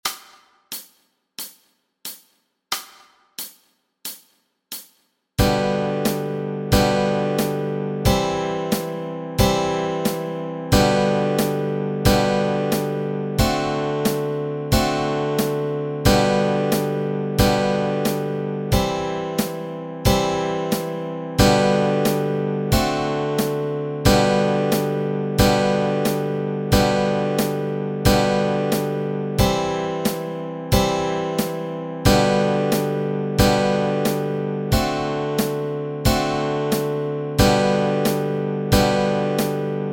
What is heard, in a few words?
chords, Easy Guitar and Ukulele